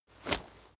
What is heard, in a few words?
leather metal walk normal single